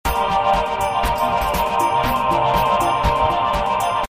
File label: 120 Cm7; Triumph